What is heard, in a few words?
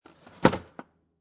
Refrigerator Drawer Close, Household, Refrigerator Drawer Close, Plastic Drawer; Kitchen